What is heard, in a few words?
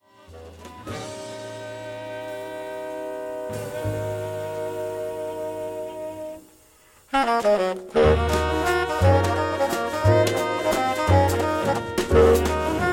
spiritual jazz